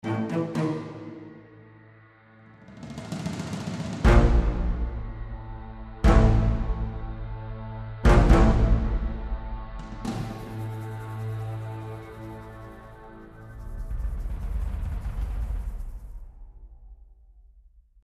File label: Tension